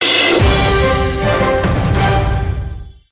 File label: win; Flying Dutchman